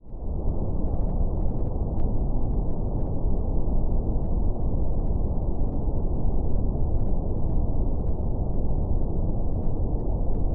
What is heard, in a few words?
brown noise